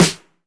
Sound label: shiny acoustic snare sound e key, Royality free acoustic snare sample tuned to the E note